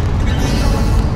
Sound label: glitch spawn; skibidi toilet glitch